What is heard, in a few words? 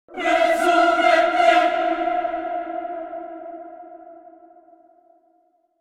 Minigame Failure